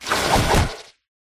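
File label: pterodactyl boss attack middle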